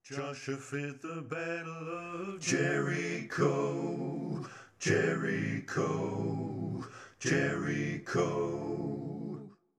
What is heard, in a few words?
jerico funk